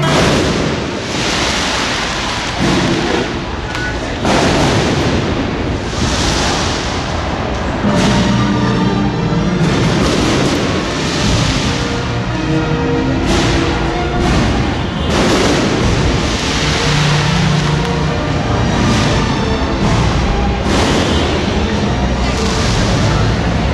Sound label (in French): Au Venezuela centre commercial